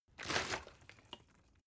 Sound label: bottle water sparse upend soft; berufe